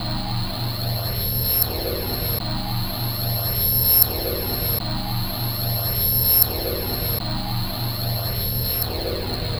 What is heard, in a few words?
Sinking Wave; Sonic Foundry (Sony Creative Software); Loop Noir